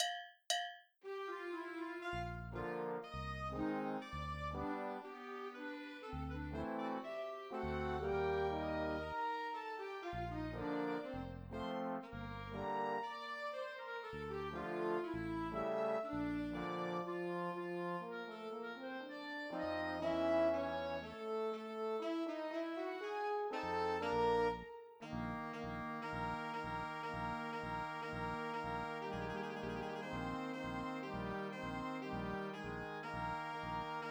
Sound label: minus Trumpet Classical